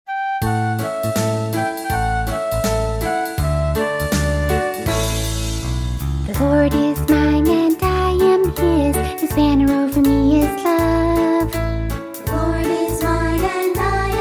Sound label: Childrens Choir